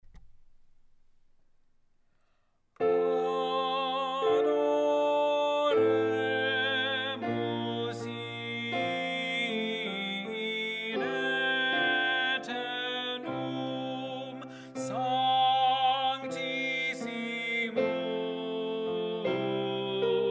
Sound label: Tenor; Adoremus in Aeternum, G. Allegri